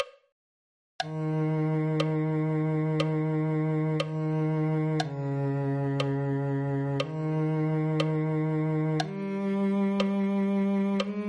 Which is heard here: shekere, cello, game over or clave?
cello